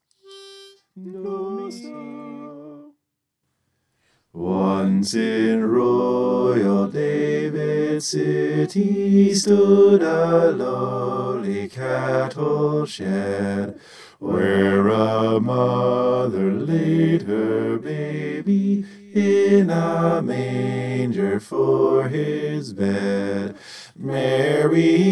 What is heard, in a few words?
bass